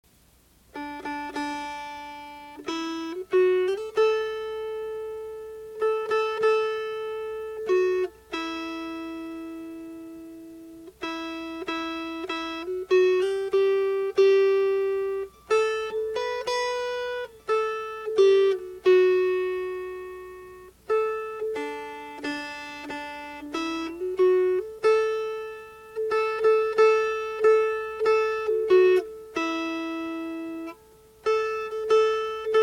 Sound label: Putting On The Style Melody